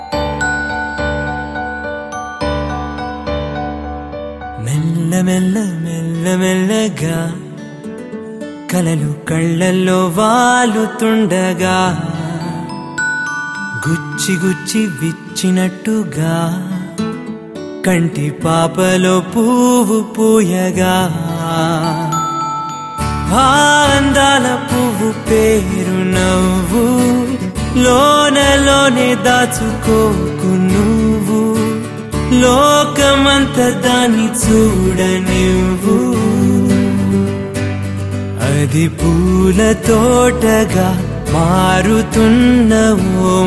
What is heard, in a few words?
Male Version Telugu Ringtone